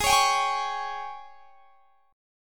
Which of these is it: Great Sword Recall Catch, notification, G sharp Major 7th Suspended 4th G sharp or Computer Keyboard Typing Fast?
G sharp Major 7th Suspended 4th G sharp